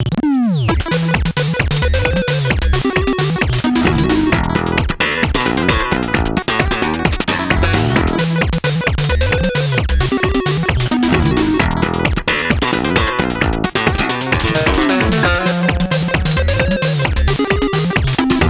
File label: 8bit BGM